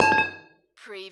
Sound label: Hit Metal Pipe; lynne-publishing